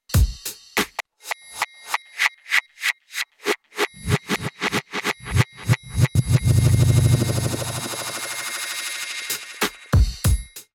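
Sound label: Lp Rev Delay Loop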